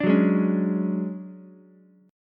D Major 7th Flat 5th D down Ukulele; strummed; JGuitar

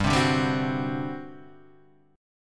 G Major 7th Suspended 4th, G, down; GM7sus4 strummed

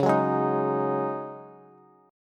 Db Suspended 2nd Suspended 4th, Bass; strummed; JGuitar

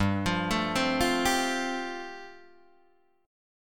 G 7th Suspended 2nd Suspended 4th, Guitar DADGAD

